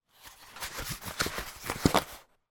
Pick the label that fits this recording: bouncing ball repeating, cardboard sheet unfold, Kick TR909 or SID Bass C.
cardboard sheet unfold